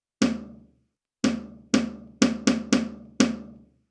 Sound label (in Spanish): Ritmo